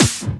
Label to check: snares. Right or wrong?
Right